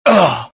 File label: death, pain; mohaa; dialogue